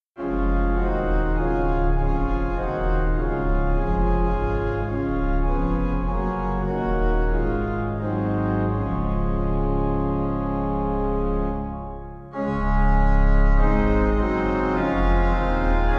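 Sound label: pipe organ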